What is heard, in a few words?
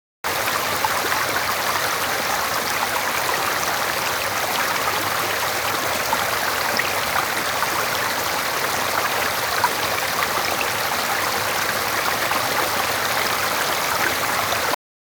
water stream large; best service Blue Box; water streams